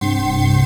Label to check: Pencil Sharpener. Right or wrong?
Wrong